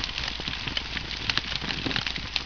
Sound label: flare